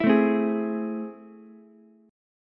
A Minor Major 7th, down, Ukulele

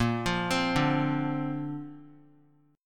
Bb Major Add 9th, Bb, down; arpeggiated; JGuitar

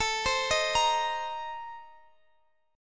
Bb Major 7th Suspended 2nd Suspended 4th

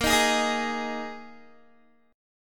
Bb 7th Suspended 4th Bb Guitar 4E,4A,5D,5G,5C,6F; strummed

